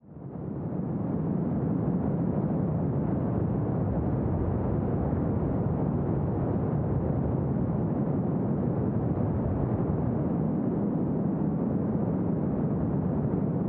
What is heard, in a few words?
mountain roar